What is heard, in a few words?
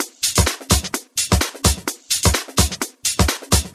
Latin House Loop 128 BPM; Vengeance Samples